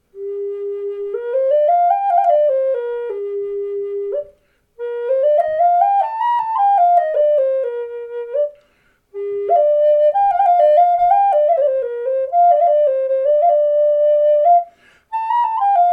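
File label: G sharp 4 Walnut block, Native Style Flutes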